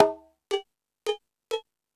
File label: FX Sounds, Drum Machines E-MU Pro-Cussion